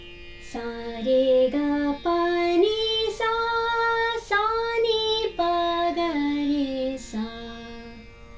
Hamsadhwani Aroh

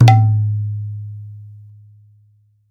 "jha", khol

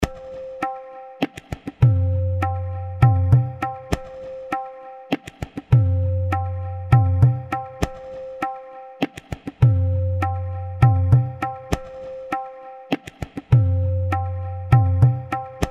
ardha jayatala, tala

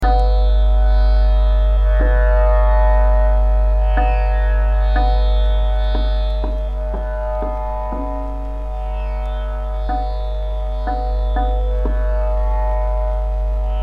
Bada Khyal, Ektaal Vilambit; Bairagi Todi; Beeti Sari Rain

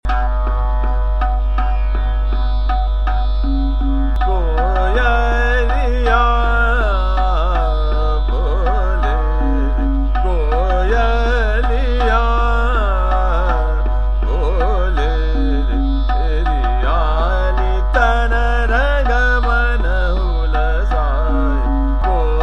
Chota Khyal, Trital; Shankara; Koyalia Bole

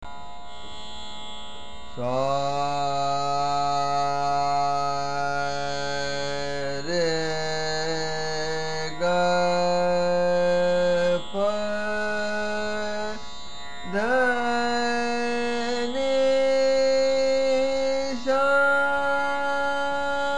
Alhaiya Aaroh Avroh

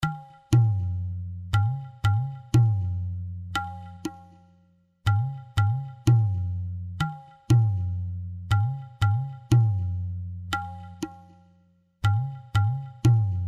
dipchandi, tala

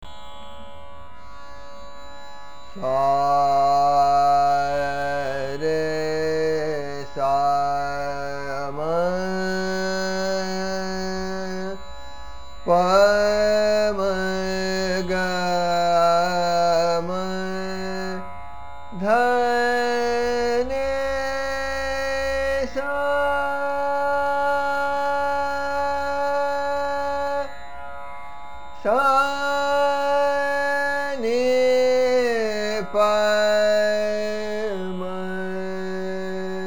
Bahar Aaroh Avroh; tanarang

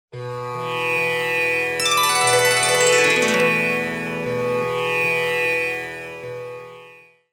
swarsangam

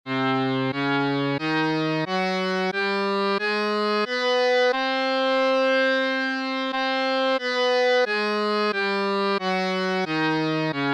todi, thaat

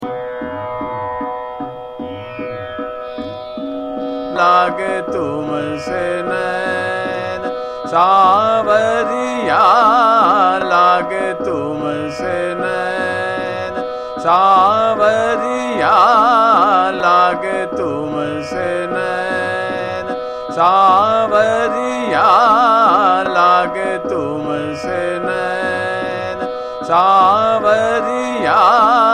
Chota Khyal - Trital, Madhukauns; Laage Tumse Nain Sanwariya

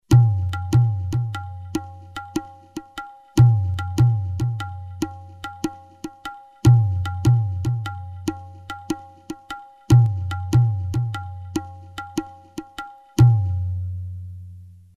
bhajani, tala

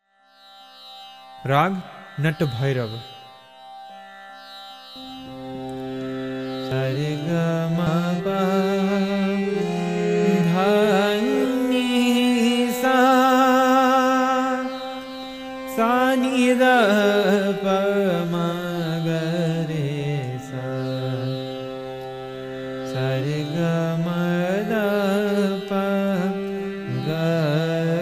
nata bhairava, raga

